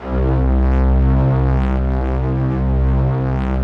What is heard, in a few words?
SPACE STRINGS C2, Yamaha VP-1; Music Store